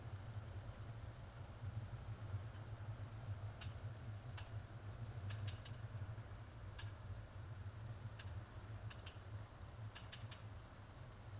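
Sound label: hammer killer whale playback; hammer-kw playback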